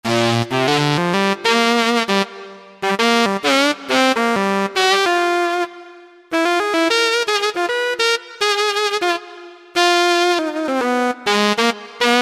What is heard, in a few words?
Tennor Sax, Yamaha EX5R; Factory presets